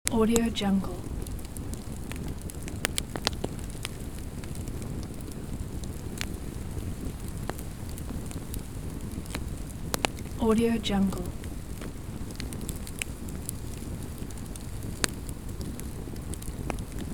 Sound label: campfire; sviridpavlov